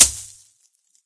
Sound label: hit leaves; weapons; source engine; dod